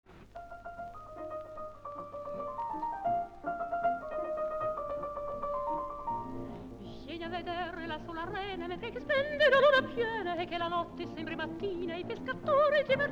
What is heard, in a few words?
Tarantella